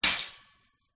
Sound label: shopping cart metal flap close; foley; zapsplat